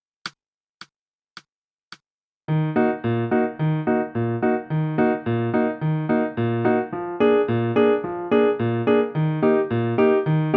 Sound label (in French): fantaisie, saxophone